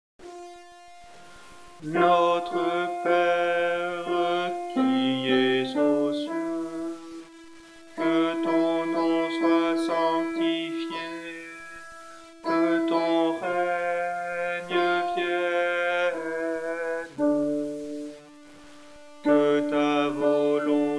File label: alto